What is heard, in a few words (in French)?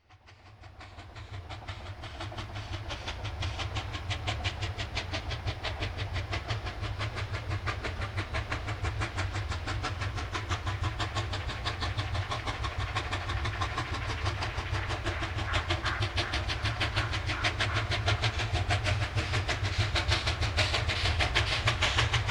locomotives a vapeur; sonotheque